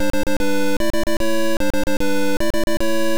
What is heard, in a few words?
Boss Destroyed; Zexas Limited; msx